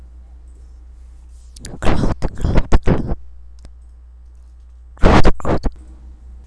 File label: crowd